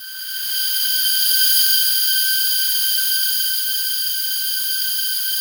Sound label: SLOW STRINGS; Music Store; 90's Sample CDs; Yamaha VP-1; SLOWSTRINGS4